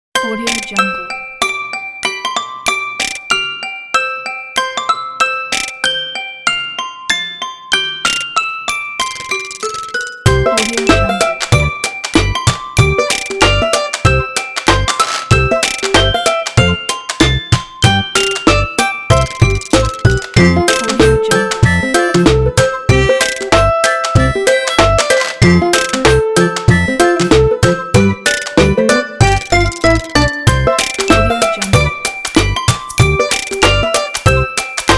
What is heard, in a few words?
childrens happy walking